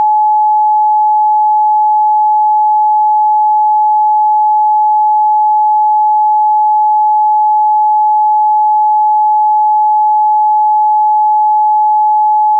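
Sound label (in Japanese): 852 Hz, ソルフェジオ周波数 信号音; 第6チャクラ 紫; 直感力の覚醒、目覚め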